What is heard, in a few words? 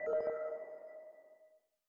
Complete